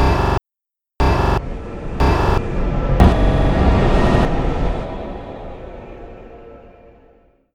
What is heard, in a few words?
powerup off